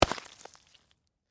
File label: Impact Ice Block, Hammer; Impact, Ice, Block, Hammer, Wet; Miscellaneous Impact Sound Effects